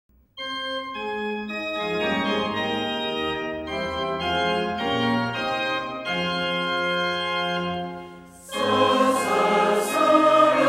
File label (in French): chorale; saint le seigneur; misericorde